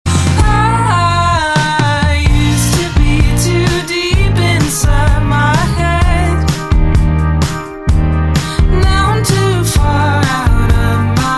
free instrumental ringtone

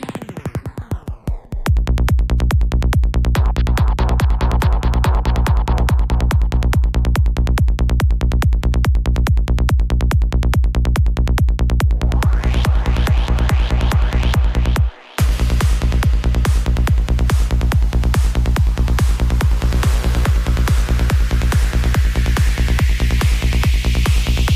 ringtone; argon sphere

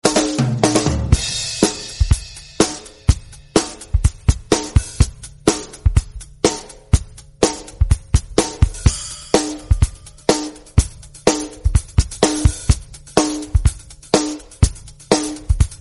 drum kit ringtone

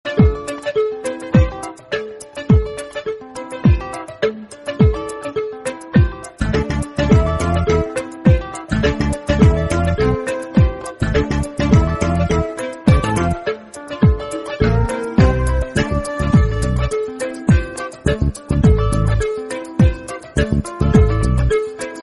african tune ringtone

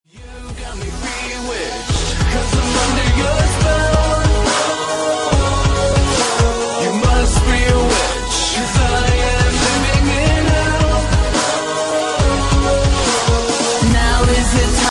pop ringtone

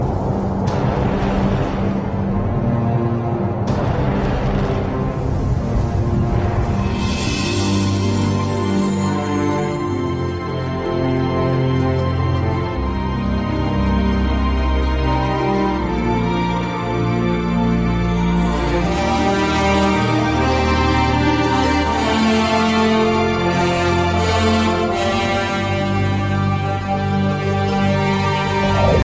samsung jet ringtone; Samsung Ringtones

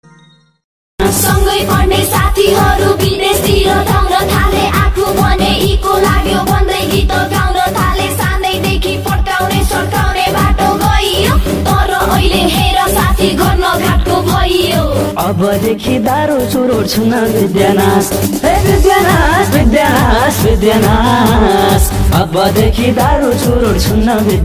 nepali romance ringtone

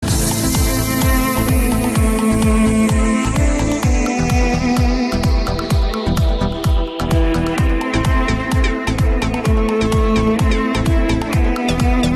arabic violin trance ringtone

